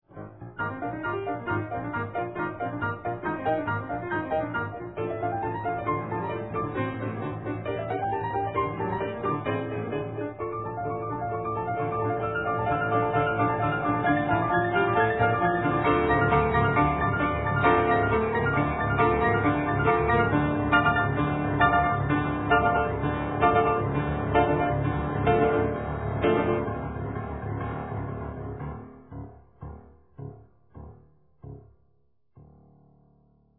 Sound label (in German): klavier